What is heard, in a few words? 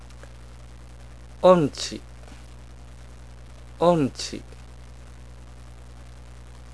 tone deaf; japanese